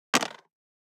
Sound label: DICE on Plastic, Throw and Roll, Metal, 2 Two Dice; Samples; ArticulatedSounds; DICE Sfx Party (Extended)